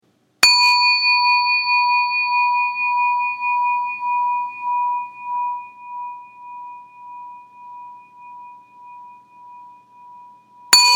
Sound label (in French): Bol chantant tibetain 8 cm; nepal; petit bol chantant tibetain; Thado; TERRES ARTISANES